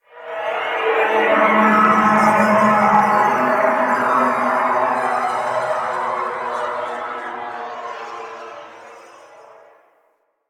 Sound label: Interior Texture, Ambience; Warframe; Quests; TheNewWar; Cetus; Unum Tower